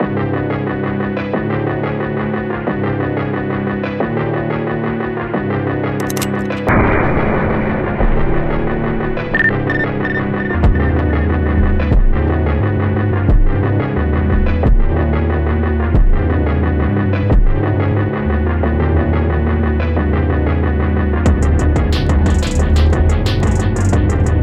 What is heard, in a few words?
Hard Phonk